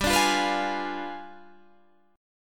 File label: G 7th Flat 9th, Guitar, Standard; 15,17,15,16,18,16; G7b9 strummed; capo=2